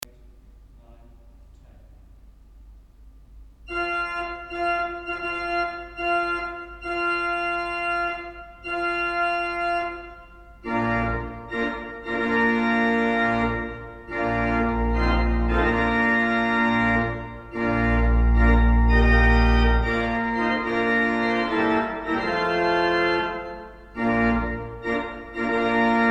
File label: Bridal March Organ